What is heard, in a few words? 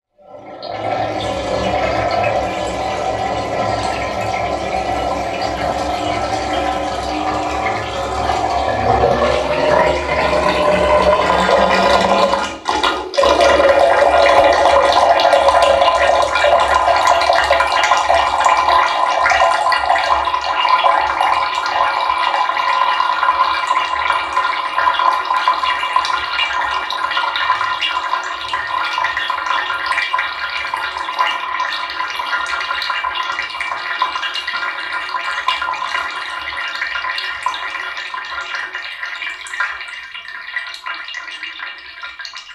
household bath draining; sound effects one